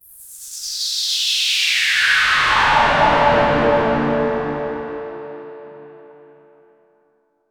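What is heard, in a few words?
airy sweep with industrial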